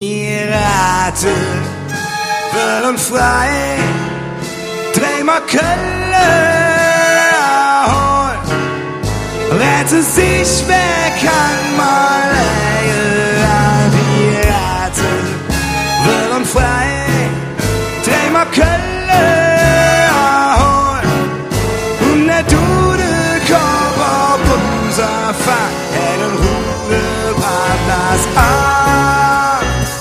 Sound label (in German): live mit orchester